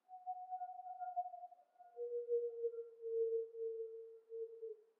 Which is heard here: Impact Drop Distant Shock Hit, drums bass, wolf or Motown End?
wolf